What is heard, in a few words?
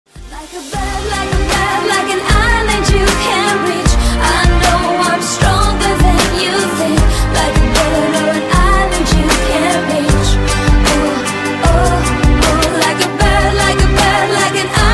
Cool Eng Song